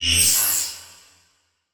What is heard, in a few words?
Title Transition Electrical Zap